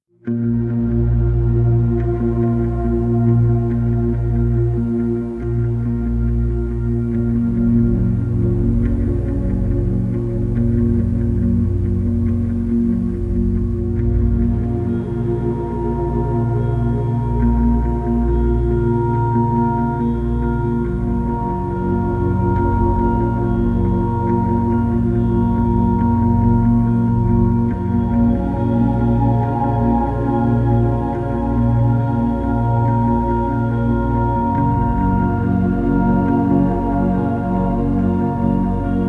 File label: Game Startup; Black Mesa